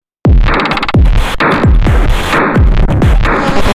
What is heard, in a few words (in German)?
rhythmus